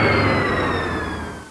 minigun rotatedown

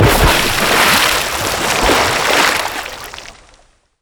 water splash big

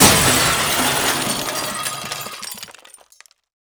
glass break

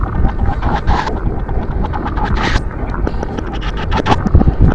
pulsing creep

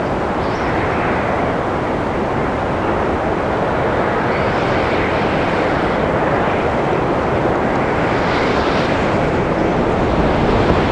ambience outdoor battle mono

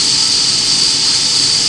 steam

